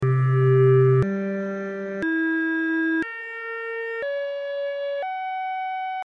microtonal variations of the all interval chord akkord c g e b cis fis; akkord 4 c g e-1 b- cis1 fis1 sukzessiv